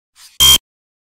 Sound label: card decline; among us